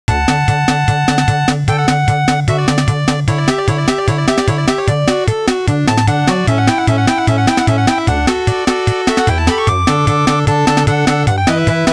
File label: Big Bonus BGM Divertimento; pachislot mr magic neo